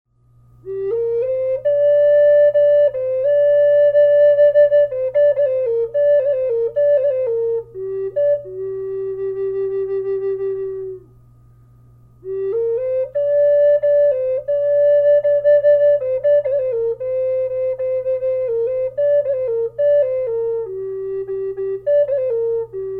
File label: flute g minor; timber wolf; collector flutes